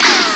SWORD LS; STARS FXS; East West; Global Sounds

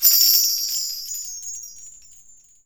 BELLTREE

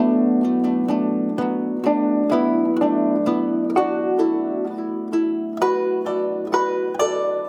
Traditional Guzheng; Asia; Continental Drift Aftershocks

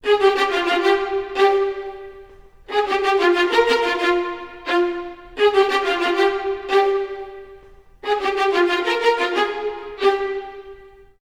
F min theme hi, orchestral arrangements

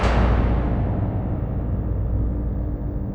A#3 TYMPA; Northstar; The Wizard VOL-1

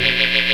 Amp Noise 2; Mo' Phatt; Digital Sound Factory Mo' Phatt

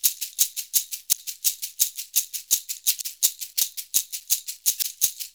SHAKER, Modern Percussion Loops

